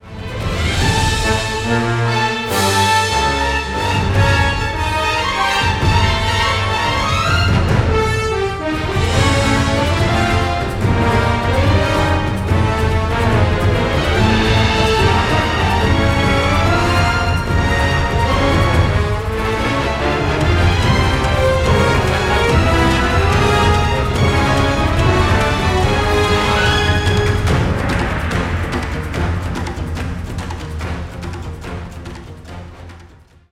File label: brass; Virtual Orchestration